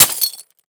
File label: Impact Glass Hit And Crunch With Hammer; Impacts